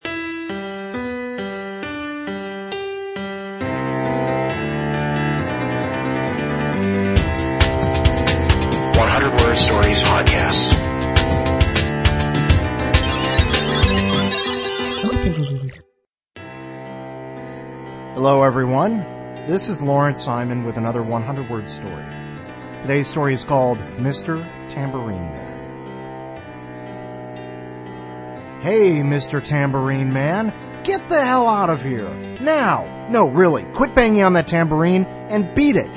tambourine

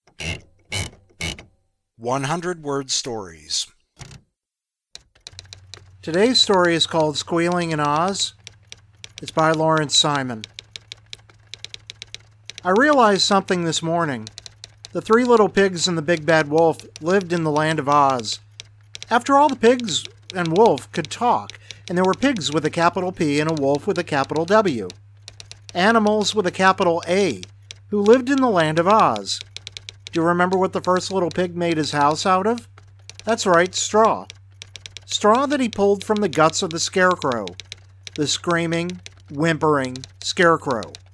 squealing

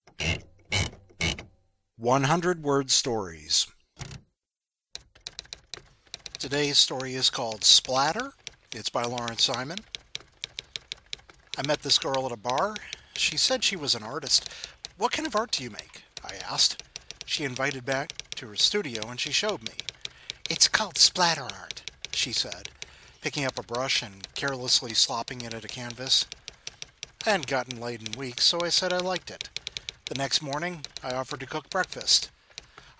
splatter

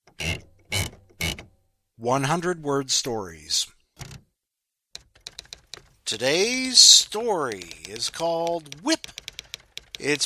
whip

